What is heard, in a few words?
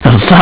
laugh that; beavis and butt head